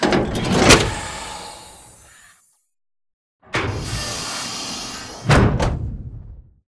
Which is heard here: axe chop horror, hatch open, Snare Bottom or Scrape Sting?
hatch open